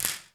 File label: snap, funk kits; Crypton - DrumBase5000